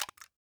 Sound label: empty, weapons spas; Spas-12; Spas-12 Reanimation